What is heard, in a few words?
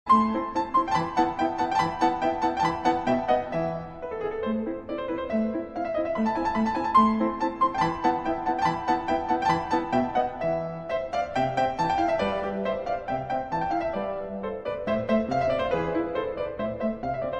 classical tureckij marsh